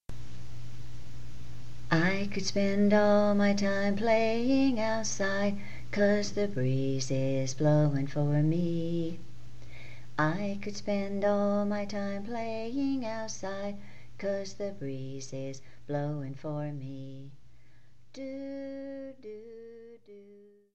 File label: Playing Outside song with fadeout; short version